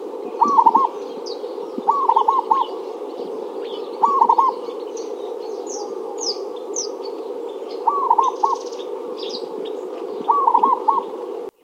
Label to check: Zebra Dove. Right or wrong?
Right